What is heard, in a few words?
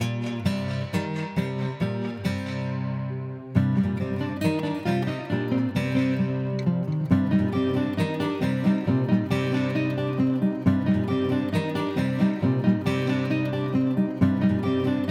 Guitar Wet; Eventide Recirculate: il delay ultracreativo.